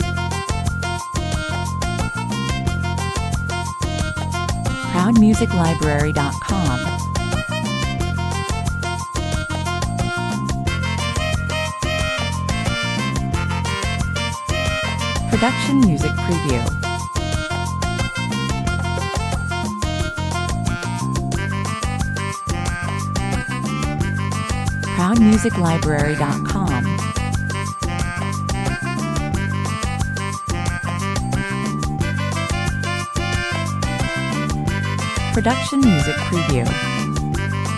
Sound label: daytime tv 90 bpm